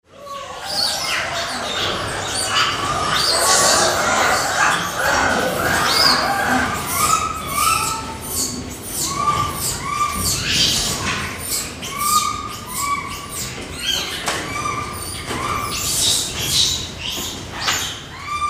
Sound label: Rhesus juvies pre room cleaning; juvenile Rhesus Macaques react to cleaning preparations